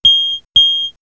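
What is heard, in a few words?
Signal, electronic fx; X-Static Goldmine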